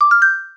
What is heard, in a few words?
talk start